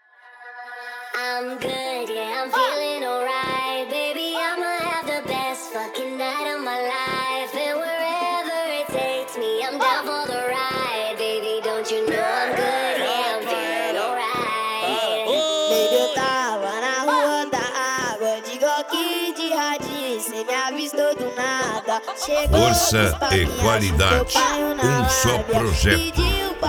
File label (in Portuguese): mega funk